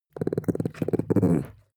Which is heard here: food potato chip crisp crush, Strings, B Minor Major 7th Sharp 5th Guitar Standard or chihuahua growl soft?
chihuahua growl soft